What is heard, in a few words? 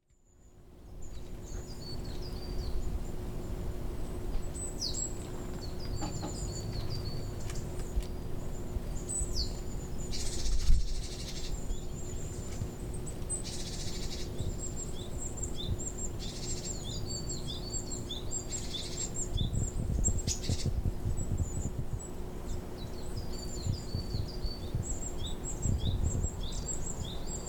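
kotori no nakigoe, small birds calling; 小鳥の鳴声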